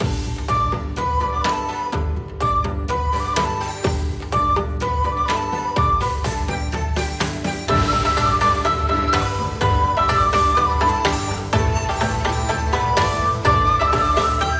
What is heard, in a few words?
Login BGM